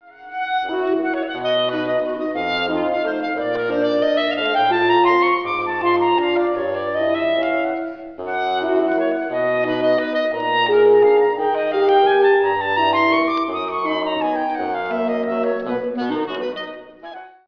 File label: clarinet